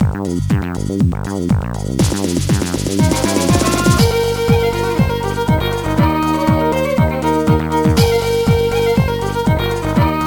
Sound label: Soul Music; the urbz sims in the city; gba